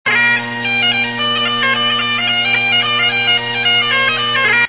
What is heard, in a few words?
Bagpipes